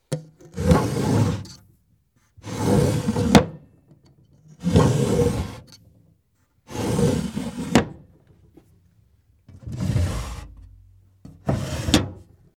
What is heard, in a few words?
drawer